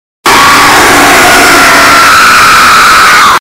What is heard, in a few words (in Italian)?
urlo spaventoso nel buio effetto sonoro sounds scary